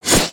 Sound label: Swing; Little Friend